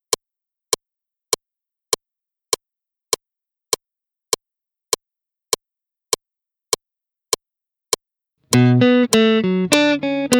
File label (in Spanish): solo 100 bpm, guitar